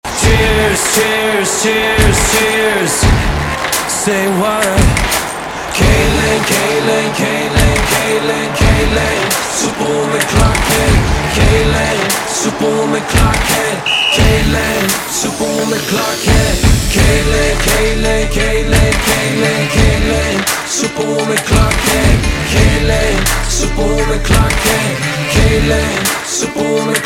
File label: chorus